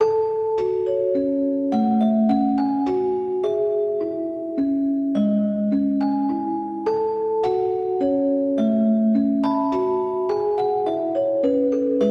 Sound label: Musicbox; Niko: Through the Dream